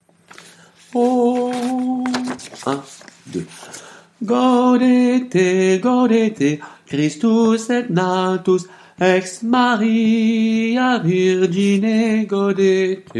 Gaudete basses, chorale

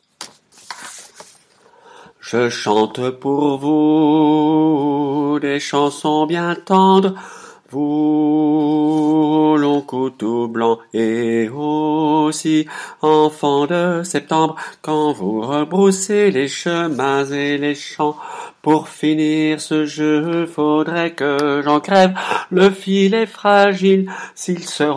altos, chorale

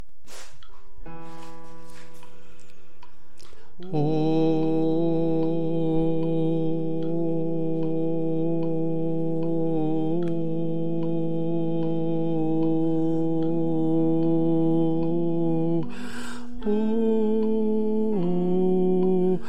El Cant BASSE complet, chorale

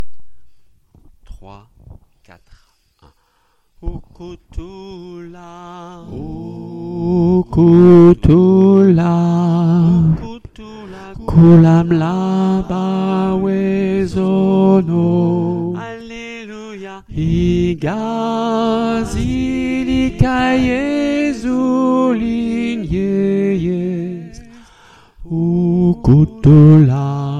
soprano, chorale; Ukuthula

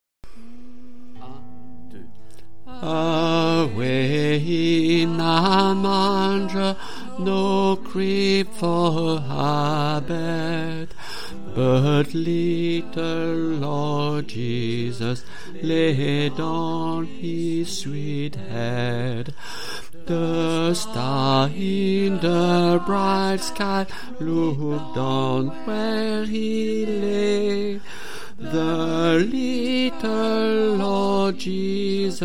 Away in a manger ALTO, chorale

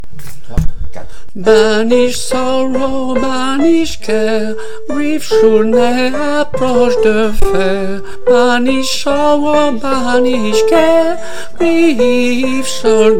Banish Sorrow SOP; chorale

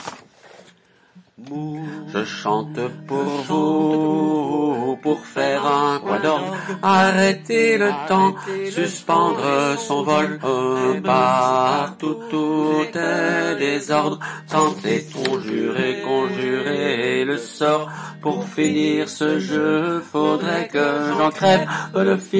ALTOS, chorale

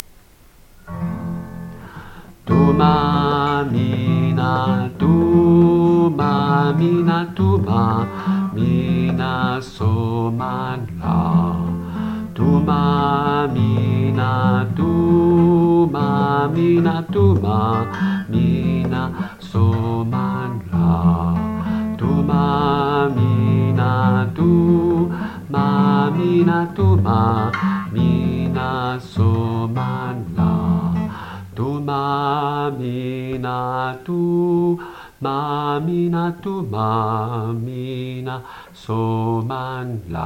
Thuma mina basses, chorale

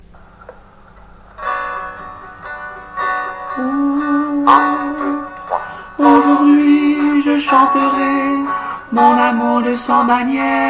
tenor, chorale; aujourd hui je chanterai

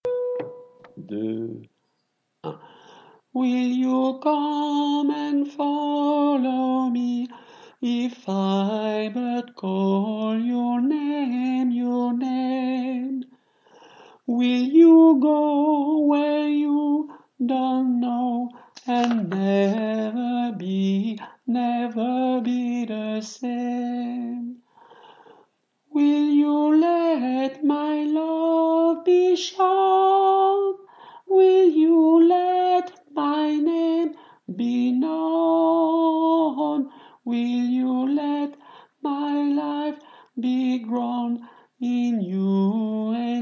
tenor, chorale

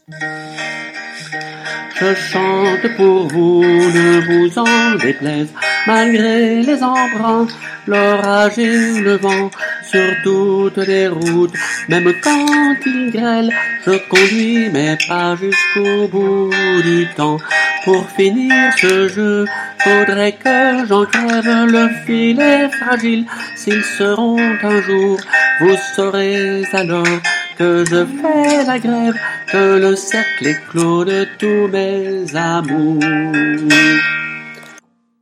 guitare soprano, chorale

